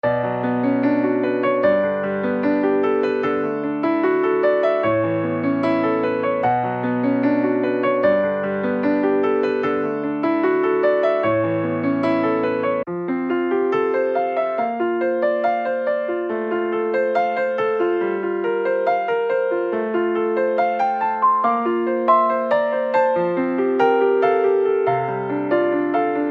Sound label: Piano Melodies